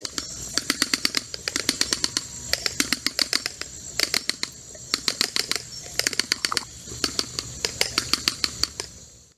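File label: peccary sounds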